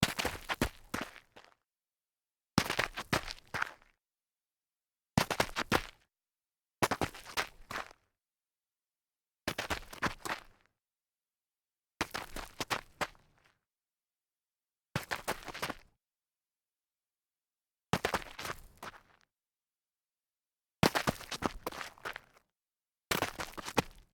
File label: sneaker stumble side light grit, foley